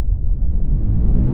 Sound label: Engine start